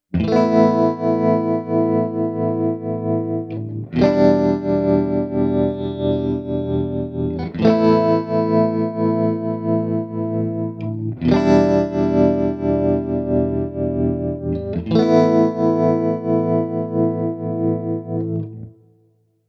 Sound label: bias tremolo stages